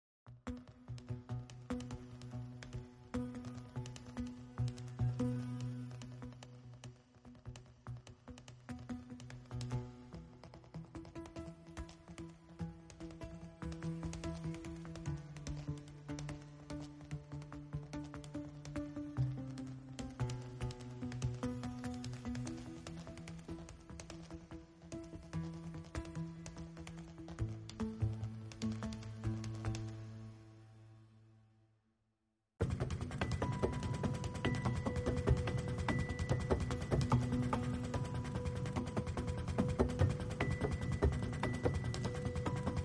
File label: Flickering Piano FX Only